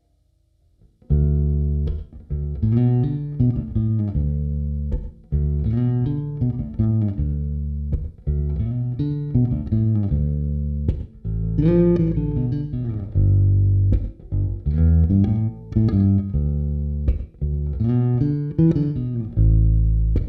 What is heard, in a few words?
Fulltone BassDrive SVX Ambiance